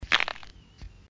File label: pigbank click; moneybank